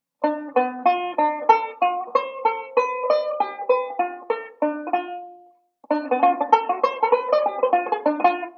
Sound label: ii V Pattern, banjo